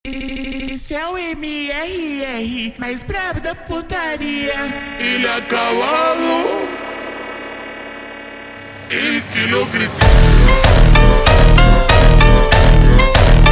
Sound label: Funk ultra Slowed